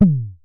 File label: Synare Filter; Star Instruments Synare 3